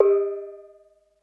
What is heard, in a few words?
PERC